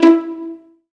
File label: STR PIZZ, STR Pizzicato; Sound & Vision; Gigapack I CD 2; Orchestral